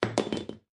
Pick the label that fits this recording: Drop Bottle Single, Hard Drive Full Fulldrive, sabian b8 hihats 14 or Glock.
Drop Bottle Single